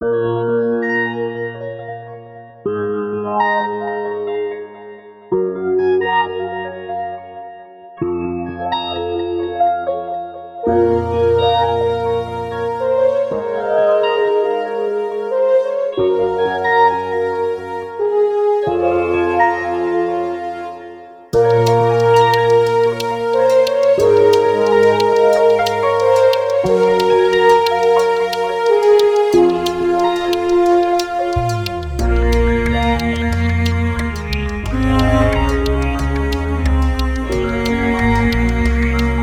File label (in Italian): piano con orchestra